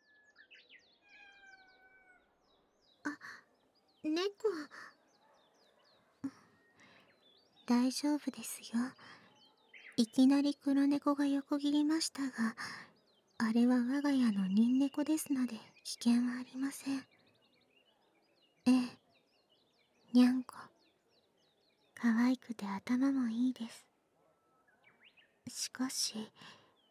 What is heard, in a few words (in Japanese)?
飴玉ディープキス